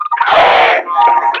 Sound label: Enemy Death; Warframe; Lasrian; Battle Barks